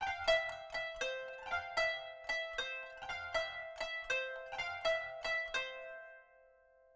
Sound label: kanun